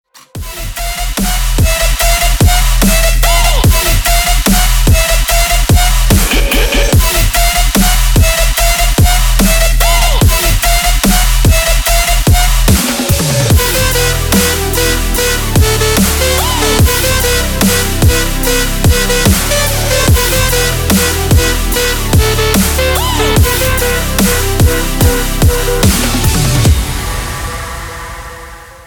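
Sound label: track in dubstep style for ringtone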